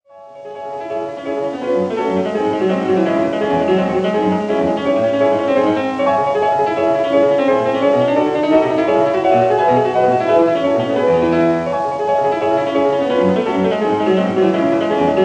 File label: Symphonic Etudes for piano Agitato; Schumann; Op. 13; Etude 4